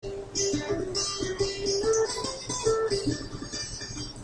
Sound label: clue music